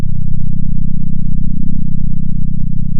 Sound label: center, sound of a small motorcycle